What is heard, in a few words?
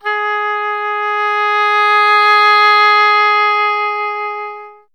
oboe swells